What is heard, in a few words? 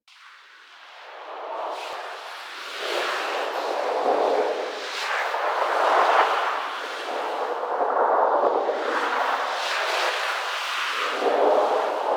Movement Wind Loop; Warframe; DE; Vehicles; RailJack; RailJackShip